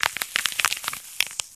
Grapefruit Tear Lng L; gore stabs & eat

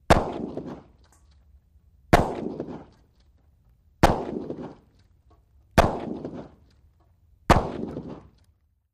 12 guage Shotgun Sing; mvmt

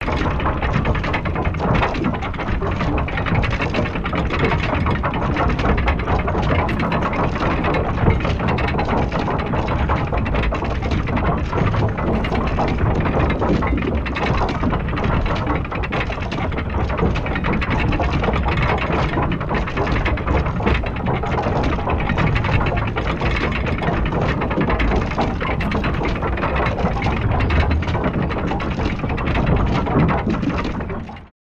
Castle Drawbridge Mechanism With Gears Winding

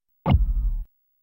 Electronic Gulps Low, servos

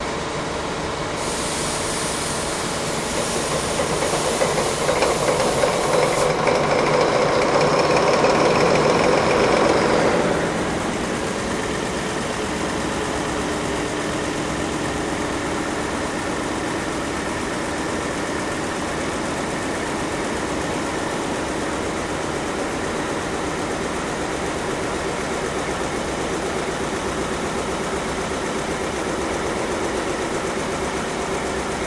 Boat Engine Rev and Steady

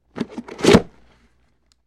Football Helmet

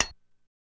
Lighter Close Click; lighters & matches

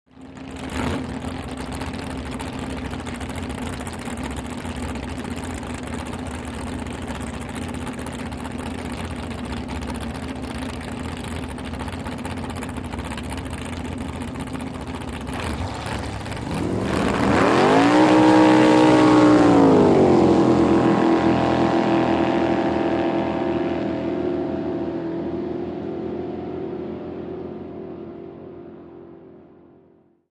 Drag Boat Idle away

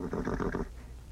Horse General Snort

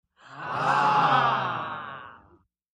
Aah Delighted React

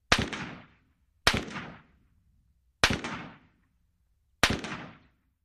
Russian SKS Single, sks 7x6

